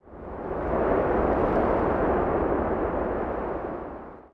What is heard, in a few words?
wind DES; The Elder Scrolls III - Morrowind; Morrowind GOTY GOG